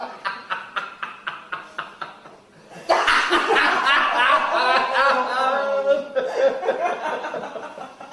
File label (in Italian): risata